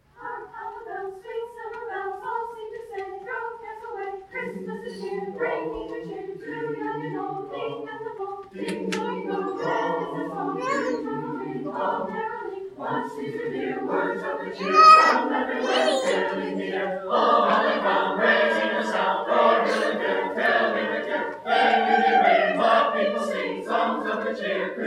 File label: 3 bells